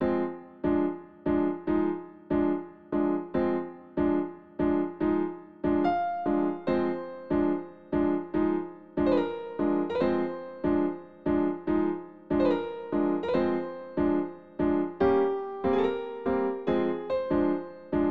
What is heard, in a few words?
Adventure Map Easy Arr. Piano; Super Smash Bros. Brawl